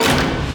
STICKS 110 BP; Rarefaction - Poke in The Ear With A Sharp Stick

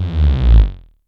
JNG BASS, Yamaha DTXpress

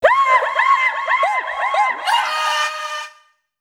VOC, VOCALS, House

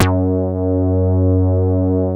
MOOG PRO, BASSES

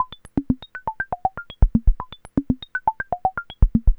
Percussive

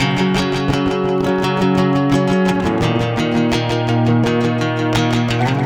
Guitar, Trip-Hop Loops; Sonic Foundry; Rondo Brothers

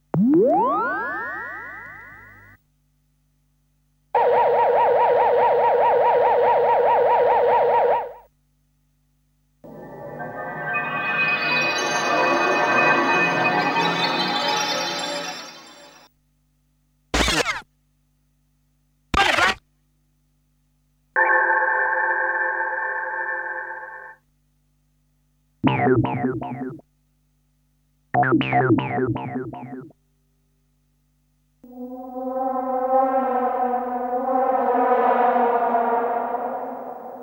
Sound Effects; Kniteforce; 1000 Samples Volume 1

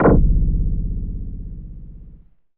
BUZ, synthesizer bass; VFX-SD

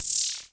REZ NOIZ, Klone Dual-Percussion-Synthesiser; KLONE NOIZ NW8

